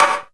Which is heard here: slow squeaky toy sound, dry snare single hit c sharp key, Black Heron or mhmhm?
dry snare single hit c sharp key